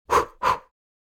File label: Human blowing short